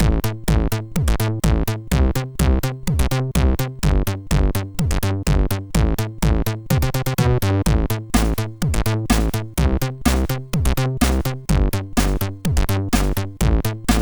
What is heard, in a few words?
Revolution plotter, MOS6581R3